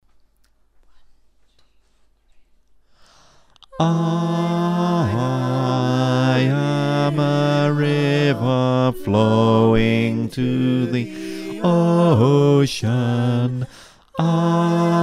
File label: Bass